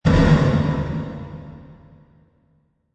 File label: Dungeon Door Slam, Dungeon door close, slam shut. Long reverb; Horror; Prison Doors